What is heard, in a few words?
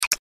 click